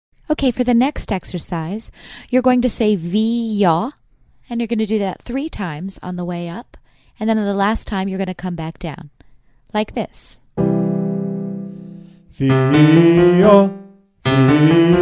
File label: Vee Yaw, male